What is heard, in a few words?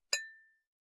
toast glass ting